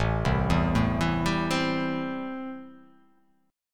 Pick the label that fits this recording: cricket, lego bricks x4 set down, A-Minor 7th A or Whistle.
A-Minor 7th A